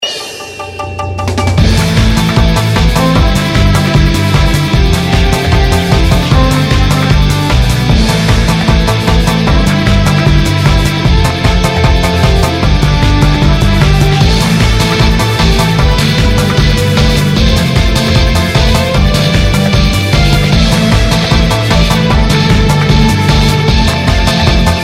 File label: bgm boss